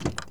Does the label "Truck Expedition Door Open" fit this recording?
Yes